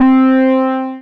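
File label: synth analog; Best Service Gigapack 1; SYN 02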